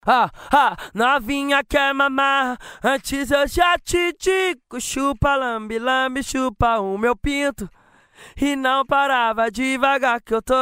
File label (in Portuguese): acapela